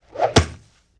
Swoosh Punch

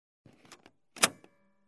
Floppy Snapback

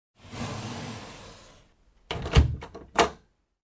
Storage room door close

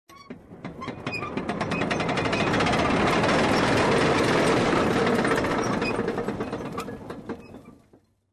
Huge Manual Grinder Machine

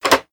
Big Button press

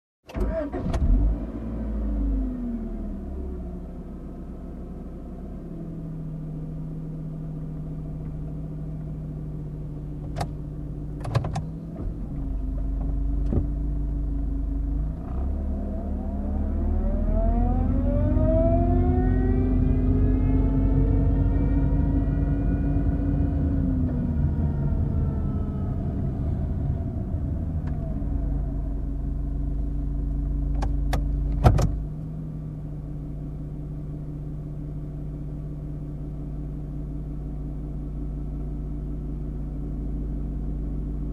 Cars backing up from cockpit

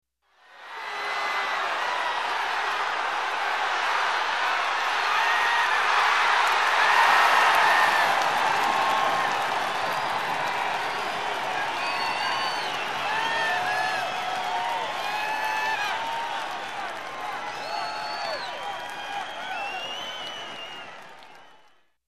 Crowd in a sports game cheering

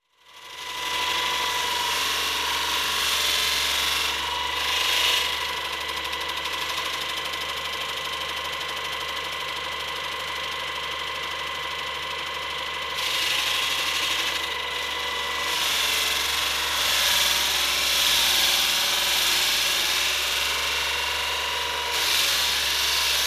Construction

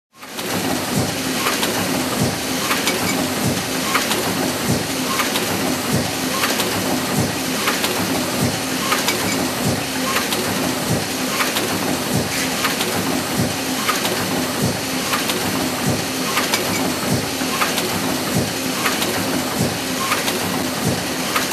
Heavy Duty Mixer Grinder Machine

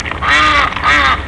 Duck quacking in lake

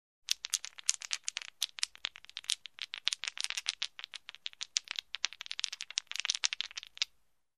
Dice on hand shuffling

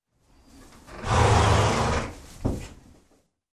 Horse Bray

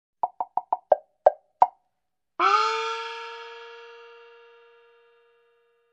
Chinese Woodblock melody

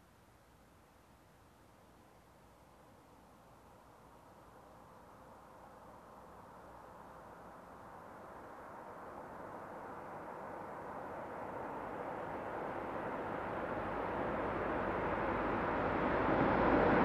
Car approaching and parking

